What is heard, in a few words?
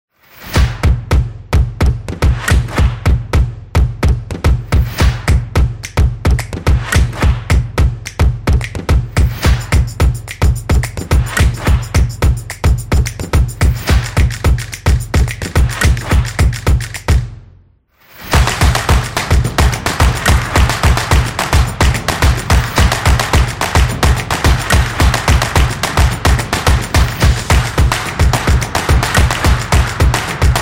Drum; stomps action